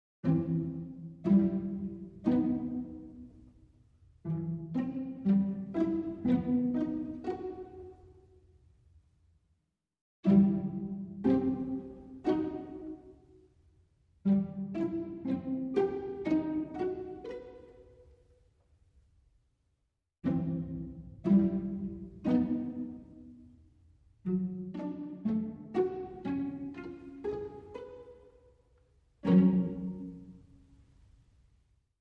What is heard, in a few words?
Strings Only